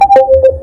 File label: door chime; star trek